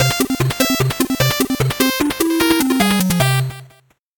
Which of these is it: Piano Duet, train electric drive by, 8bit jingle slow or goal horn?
8bit jingle slow